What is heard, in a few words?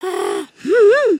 Shrug; emotes